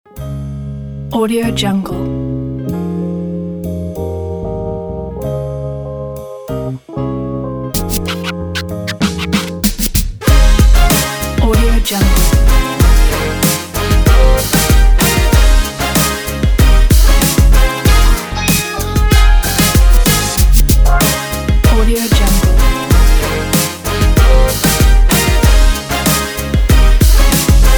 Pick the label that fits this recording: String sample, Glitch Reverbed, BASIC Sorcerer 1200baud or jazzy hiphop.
jazzy hiphop